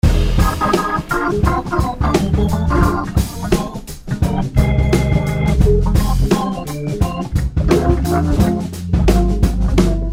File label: Piano